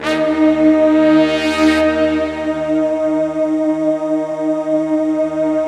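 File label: BRASS, Slow Brass Choir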